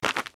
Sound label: Cave Footstep; The Inn